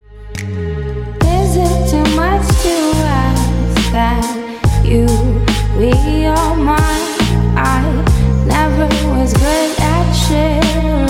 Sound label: Drums Alarm